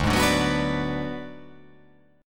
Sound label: D 6th Flat 5th D down, chord